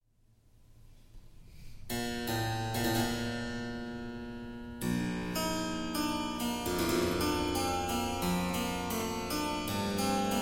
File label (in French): clavecin, Prelude in C Major; L'art de toucher le clavecin